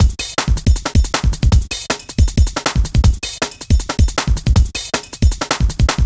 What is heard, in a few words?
Heads 158, Drum Loops, Jungle; Jungle Warfare 1&2